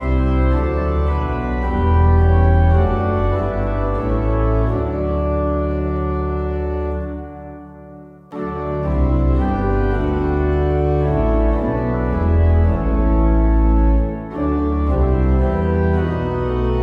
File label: organ, hymn; jacob; Hymnal 1982